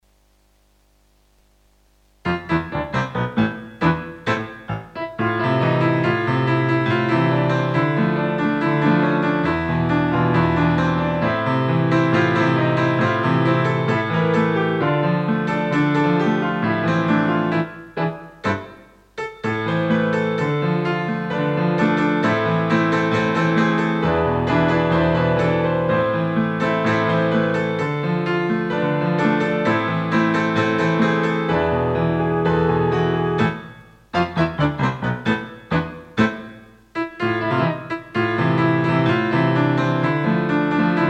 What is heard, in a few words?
tango, piano